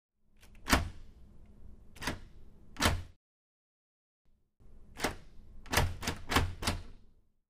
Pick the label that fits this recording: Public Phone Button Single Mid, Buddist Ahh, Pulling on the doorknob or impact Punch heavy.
Pulling on the doorknob